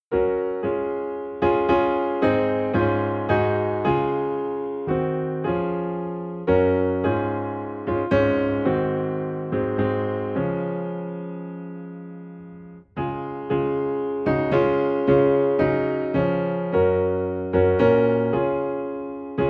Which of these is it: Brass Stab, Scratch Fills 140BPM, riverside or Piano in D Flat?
Piano in D Flat